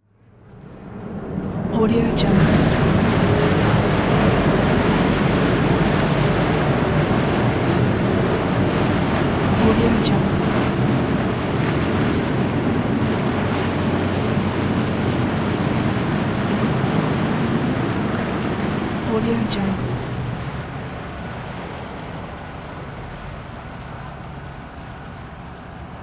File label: ship departing